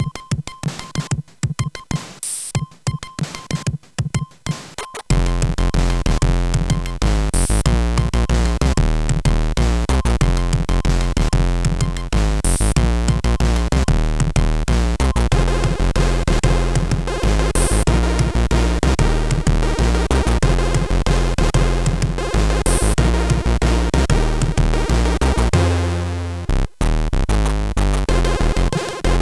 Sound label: Natural Beat